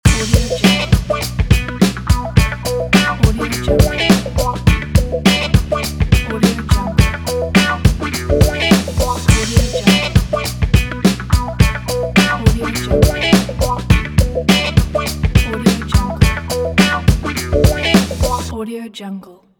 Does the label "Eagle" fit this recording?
No